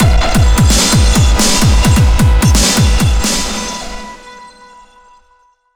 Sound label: Action Boss; PunkGirl